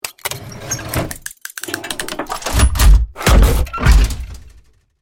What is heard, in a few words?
Mechanical metallic sequence gears movement